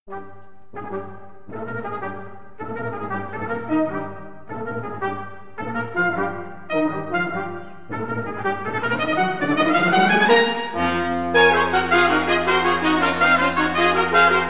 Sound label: Brass Menagerie